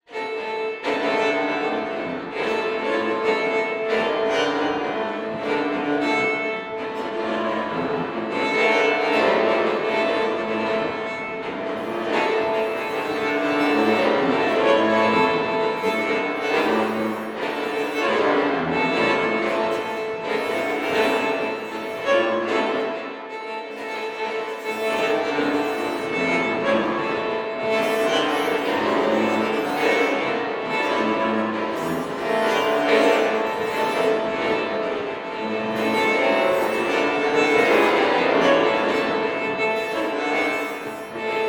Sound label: Strings; Xenakis